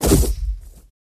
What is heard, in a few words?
Horse Hoof Heavy